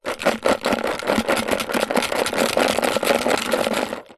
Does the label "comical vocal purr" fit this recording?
No